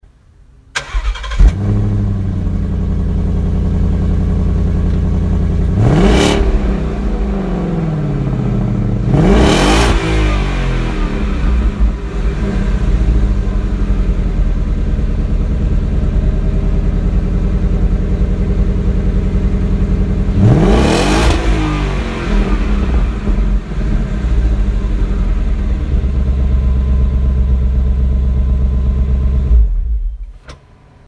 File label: Ford Mustang GT California Special Exhaust Sound